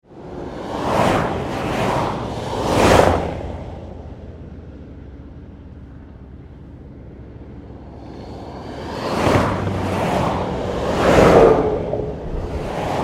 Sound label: Traffic Pass Fast City Highway - Variety of vehicles; Variety of vehicles pass close; Traffic And Car; Tom Hutchings